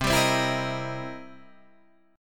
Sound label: C 7th Sharp 9th, Guitar, Open D